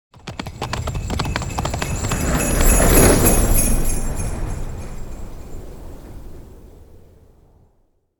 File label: Magical santa sleigh christmas passing by